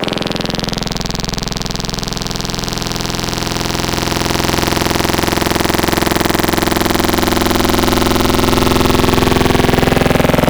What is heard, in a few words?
Phased, Growl Wavetables; Cymatics; Virus Wavetables; Serum